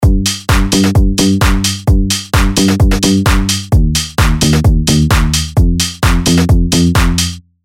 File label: Tech Groove, EDM; Nexus 3